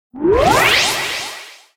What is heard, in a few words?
power up